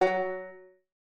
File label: Banjo Note; Bendy: Lone Wolf